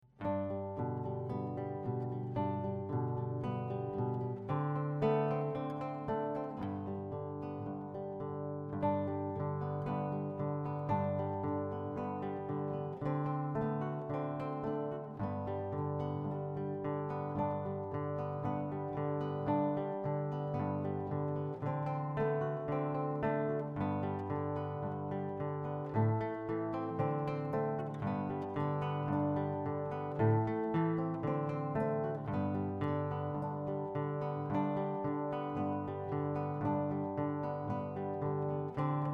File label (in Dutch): Gitaar